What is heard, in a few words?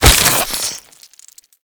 Infested Face Hugger Latch; Warframe